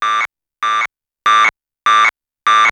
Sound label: probe bursts